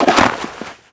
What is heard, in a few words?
foot snow deep; footsteps